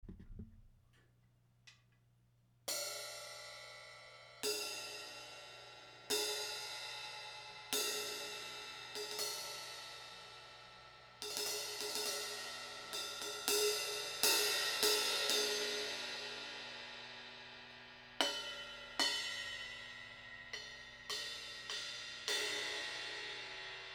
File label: Thrace Crash Rock 16; Amedia